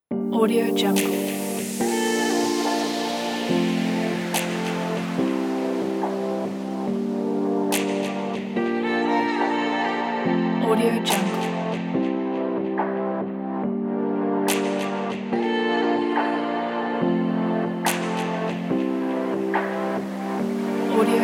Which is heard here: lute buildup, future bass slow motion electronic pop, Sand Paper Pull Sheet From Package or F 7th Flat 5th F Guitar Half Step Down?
future bass slow motion electronic pop